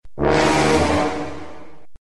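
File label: Suspense Message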